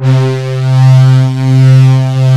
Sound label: SWELL; Pro-Rec - Synthsonic; Z1 DANCE